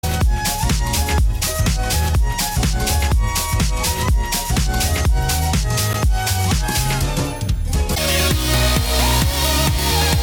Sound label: super anime groove